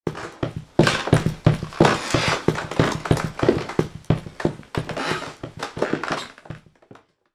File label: Footsteps WITH CREAKS; Irma Vep